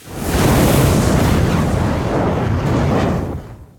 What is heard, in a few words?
Lava cast; Likho